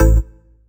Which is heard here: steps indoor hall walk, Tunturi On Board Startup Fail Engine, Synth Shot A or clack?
Synth Shot A